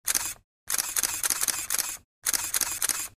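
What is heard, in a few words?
camera sound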